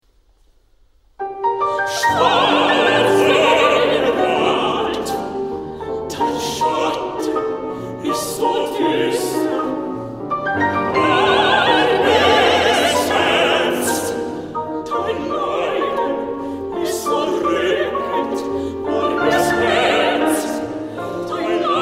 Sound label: Chorus; brahms; neueliebeslieder